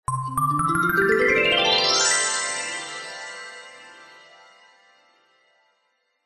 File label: magic glissando reveal spell bright chimes; fantasy; zapsplat